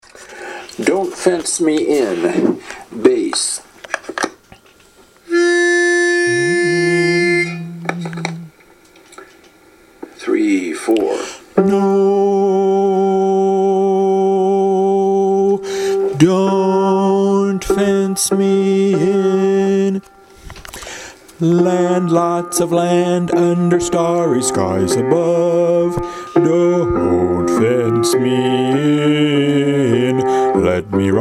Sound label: Bass